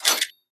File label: Sci-Fi Weapons-Loading Fx